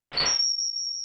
victory

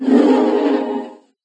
drink zinger; There; ThereClient

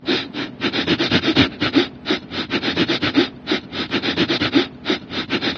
sniffing, dog; There; ThereClient

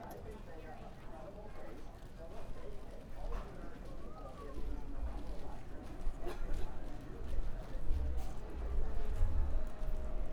AIRPORT, Ambience; SeroNoise

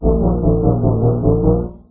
drink; There; ThereClient; sharkbite

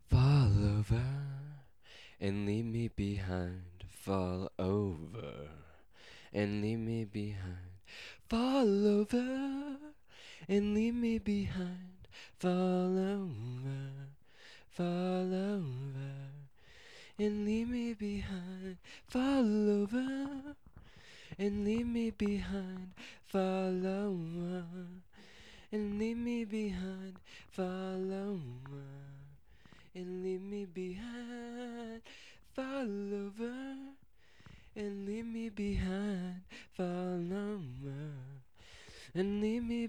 Sample Vocals

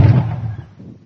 shutdown; There; ThereClient

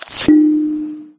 paper hit; There; ThereClient

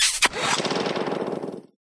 drink; There; ThereClient; cruisincooler

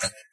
bump; There; ThereClient

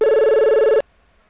ring; Logitech

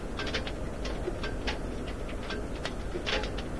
chassis noise loop; There; ThereClient

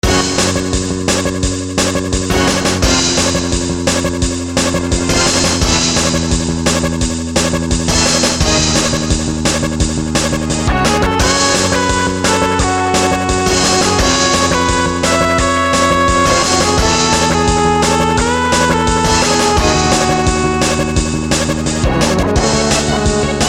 Laboratory Pass Loop

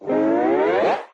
drink; There; ThereClient; hiyac

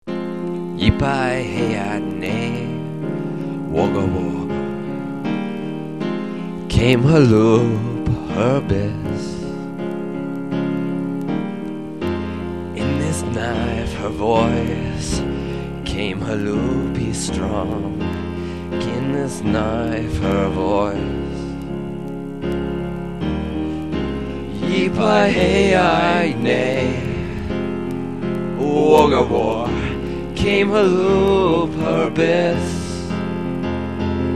Quieter Piano

